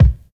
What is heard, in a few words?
smooth bottom end bass drum sound b key